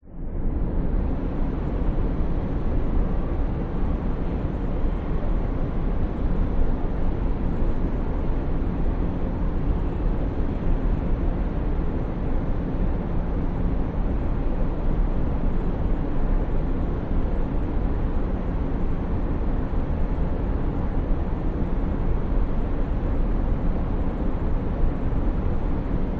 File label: industrial warehouse abandoned drone distant traffic outside